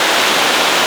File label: houses fountain; Tony Hawk's Project 8; XBOX